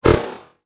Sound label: retro sound